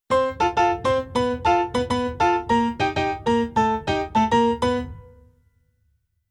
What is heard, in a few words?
Keyboard Pf Latin Pf